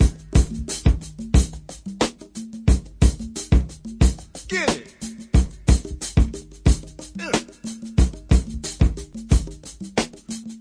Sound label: 90 bpm, Jungle with vox, drum loops and breaks